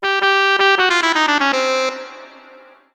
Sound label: gliss; sibelius; desired notation